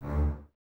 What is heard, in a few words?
Basses D 2, f Legato; String Ensembles; Miroslav Vitous; 90 s Sample CDs